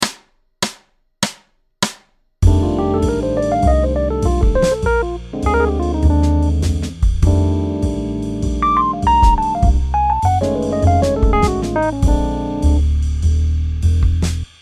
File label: Outside Blues